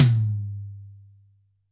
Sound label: AMBTOM, DRY+AMB TOM